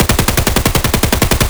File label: fal outdoor distant loop; Escape from Tarkov